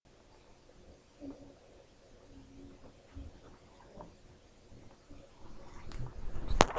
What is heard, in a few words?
Screech